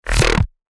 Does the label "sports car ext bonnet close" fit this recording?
No